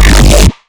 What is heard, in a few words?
Growl After just 50 wet; Velvesque